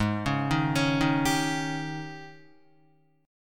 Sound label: Ab Major 7th Suspended 4th Ab Guitar 4F,4A,5C,5G,5C,6E; arpeggiated; guitar chord; 3,4,3,6,3,3; JGuitar